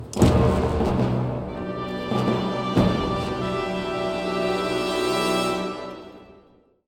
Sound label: fanfare